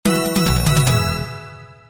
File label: sound win